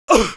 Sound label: damage, pain; mohaa; dialogue